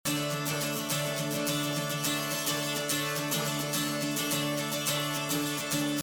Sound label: guitar